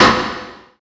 CYBER KIT, DRUMS

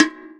EQ Perc, Percussion Mix; Equipped Music; Loungin House

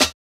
Snare, jungle breaks drum sounds

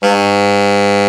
BRA TENOR, SAX; Best Service Gigapack 1; BR SAX 2

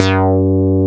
RES BASS

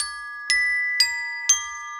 Bells, Orchestral, Rock Pop; Sonic Foundry (Sony Creative Software); Orchestral Series VOL-4 - Rock and Pop

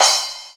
CRASH, TEKNO KIT; USB Soundscan vol.11; Drums Dance & Groove; AKAI

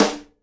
SIDE STICK, Live Snares

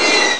monster; Amiga Soundtracker Sample Packs

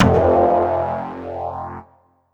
EFX Hit Synthesizer; Polyend; Plughugger

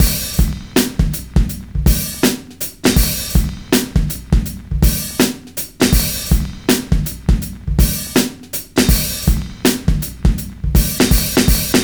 12 SCRATCH'N'SNIFF 81 bpm, Loops Breaks; POTBreaks

